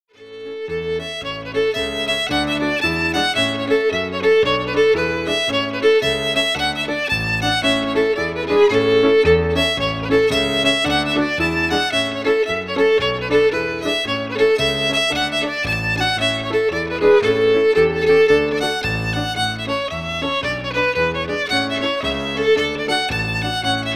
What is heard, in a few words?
Jigs